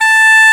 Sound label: HORN A4, SYNTHESIZER LEAD